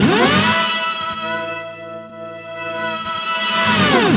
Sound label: launch pad; Spectrasonic Distorted Reality 1